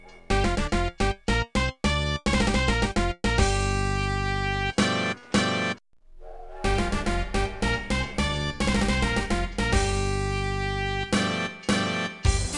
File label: Midi; openttd